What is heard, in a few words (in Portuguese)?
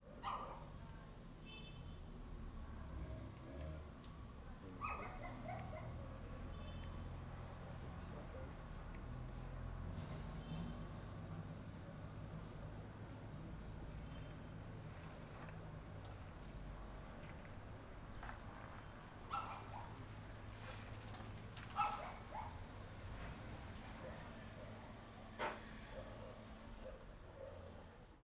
Ambiencia em Rua Residencial Paranoa com Carro Estacionando